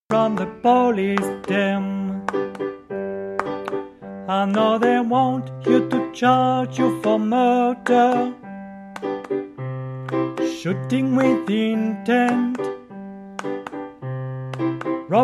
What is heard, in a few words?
Refrain Soprano